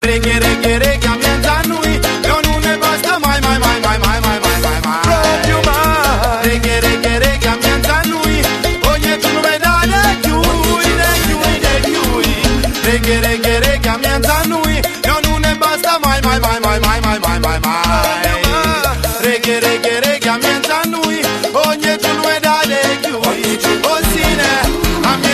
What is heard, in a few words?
ragga song; reggae